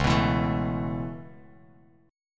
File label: Db Minor 7th Sharp 5th, Bass Standard; strummed; 9,14,14,14